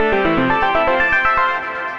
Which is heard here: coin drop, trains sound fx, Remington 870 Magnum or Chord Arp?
Chord Arp